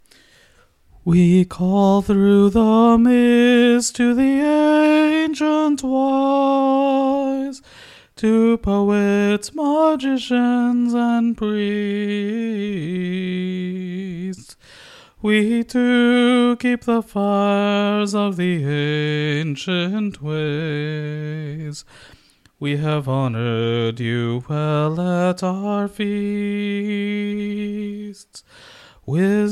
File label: hymn soprano; music recordings